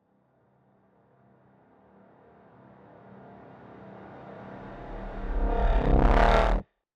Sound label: Reversing Sci Fi Moog C